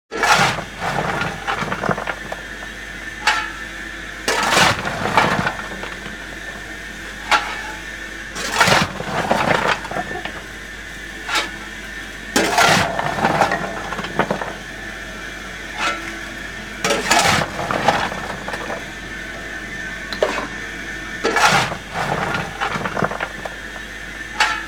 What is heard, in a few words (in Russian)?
Ugol v topku zasypajut